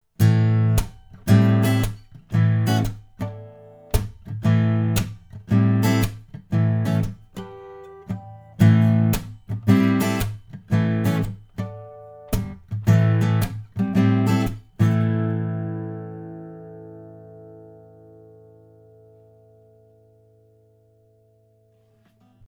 Akku Blumlein

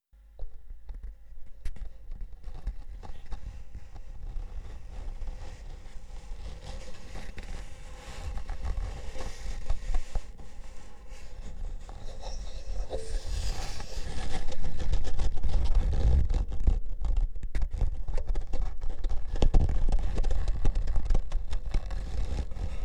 MiSo fingered

